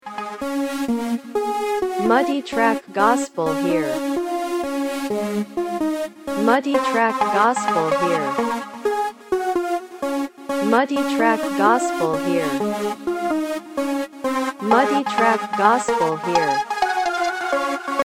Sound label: Synth Solo